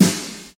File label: wet snare drum sound g key, snare drum sample tuned to the G note; snare drum samples